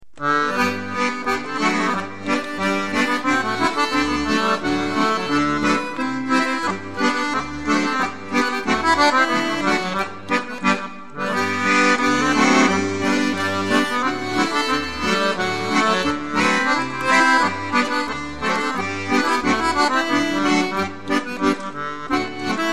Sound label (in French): instruments accordeon chromatique